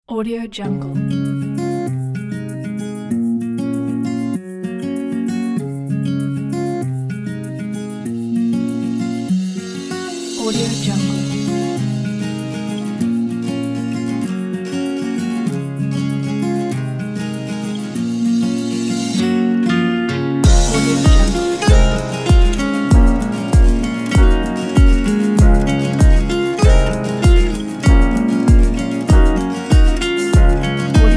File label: this is guitar